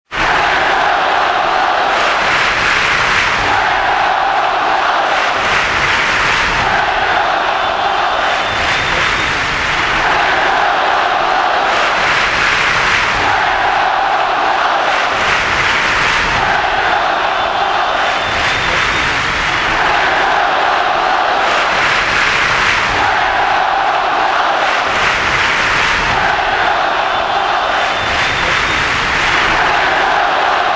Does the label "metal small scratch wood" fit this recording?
No